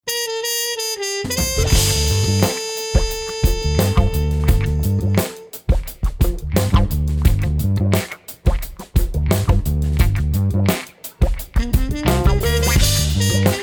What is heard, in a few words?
drum recording funk funky drums; studio hamburg; deichtv drummer profischlagzeuger; huth; wagner